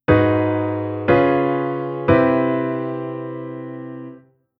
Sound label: Chord Sub V7 To Five